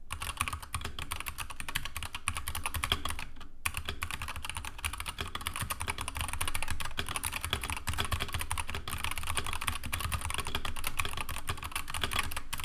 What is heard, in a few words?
SteelSeries Apex 9 TKL soundtest